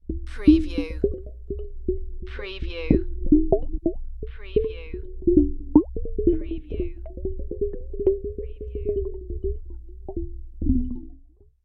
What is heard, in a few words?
Underwater Bubbles